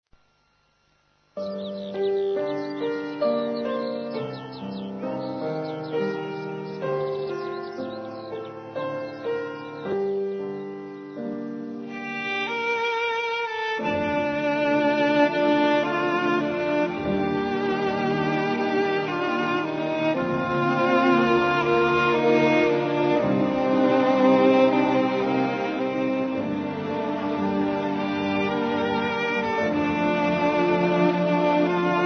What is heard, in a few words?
instrumental; romeo and juliet